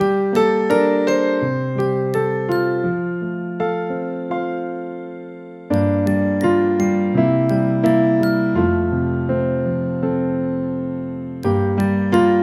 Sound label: instrumental; conte; Jean de la lune